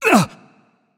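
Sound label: surprised, non-verbal; voicepack; smite; merlin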